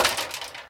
ladder step; Golden Crayon Sounds

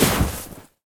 snow fall; Golden Crayon Sounds

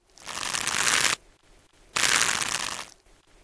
rope; Original TK Sounds

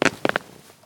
stairs fall; Golden Crayon Sounds

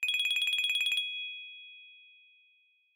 Ringtone US Haptic, iOS Sounds

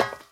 metal step; Original TK Sounds

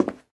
carpet step; Golden Crayon Sounds

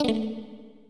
long clicked

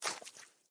mud step; Golden Crayon Sounds

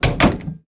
door close; Golden Crayon Sounds

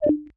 Braille Display Disconnected mono; iOS 26 Sounds

